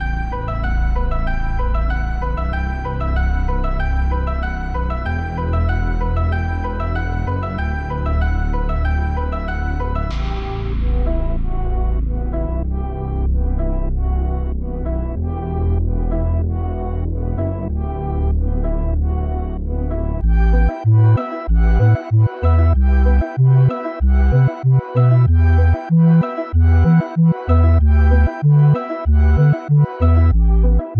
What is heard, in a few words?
95 BPM E Min, Hip Hop Melody Loops; Perro